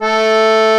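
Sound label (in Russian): аккордеон ля2 a2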